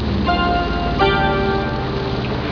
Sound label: CHINEES MUSIC